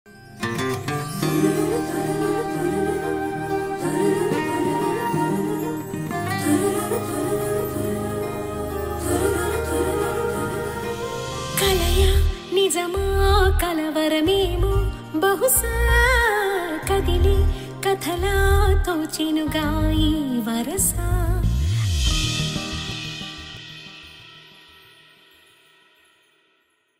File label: Song; Calling Sahasra